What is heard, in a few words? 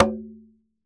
Drums Percussion Congas